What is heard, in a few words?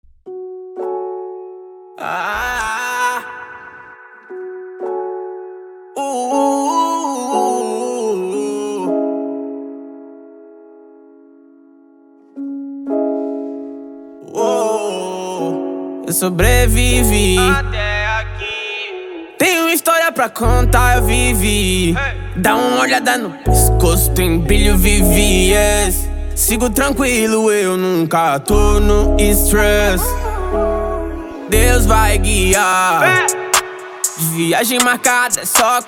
trap